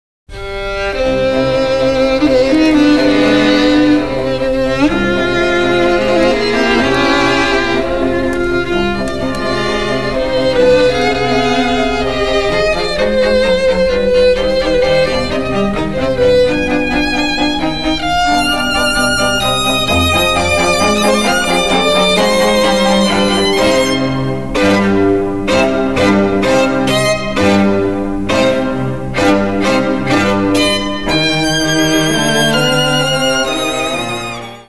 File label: beethoven strings 7db; masteringbox; mastered